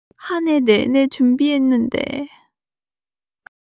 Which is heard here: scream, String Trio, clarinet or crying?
crying